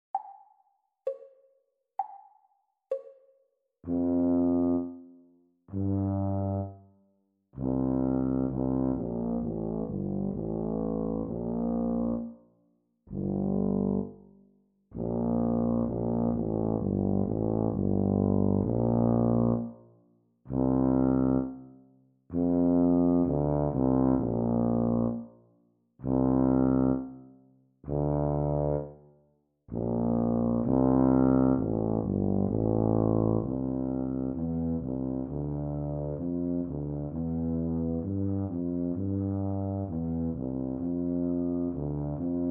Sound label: Bb tuba duet practice speed; Grade 2